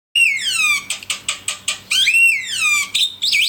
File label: Recording, bird; Pengusir Hama Burung Otomatis